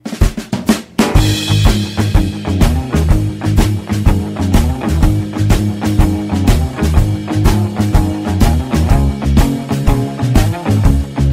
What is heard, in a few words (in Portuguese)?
ANIMADO; pack de tilhas e efeitos 2.0